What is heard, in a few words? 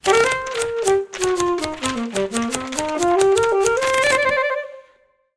newsroom shuffle